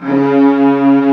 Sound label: STRINX C#; Analogon